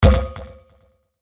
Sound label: Neckbreaker, percussion; revealed percussion vol 2